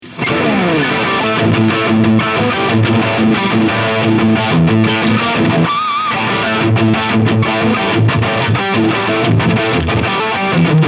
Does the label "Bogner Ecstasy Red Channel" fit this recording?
Yes